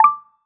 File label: chat notification